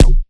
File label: Kick, Data Mosh Hits; Kicks; 99Sounds; Mangling Audio